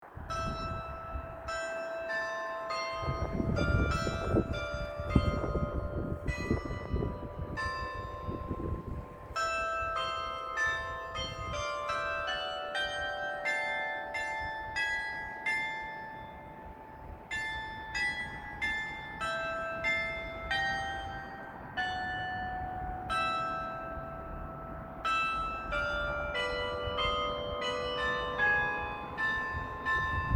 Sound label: Glockenspiel